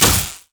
grasping roots hold; Zyra; LoL

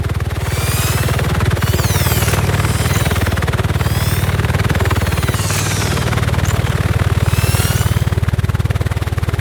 hermit robot idle

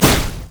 manta strike; LoL; fizz

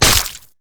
basic attack; nidalee

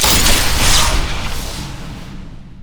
gravitation field; LoL; viktor

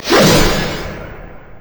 yasuo base crit attack; LoL

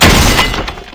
basic attack; LoL; garen base

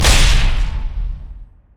dragon strike hit; LoL; jarvaniv

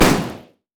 micro rockets; LoL; heimerdinger

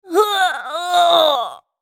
Tristana dying

